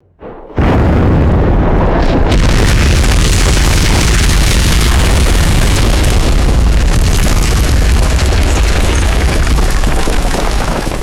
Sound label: heavy explosion with falling debris